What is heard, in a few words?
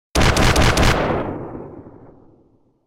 23mm